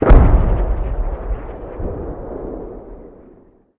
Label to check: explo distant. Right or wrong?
Right